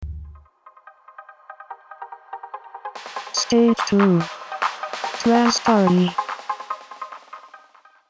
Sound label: Trance Party